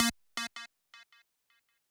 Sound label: lead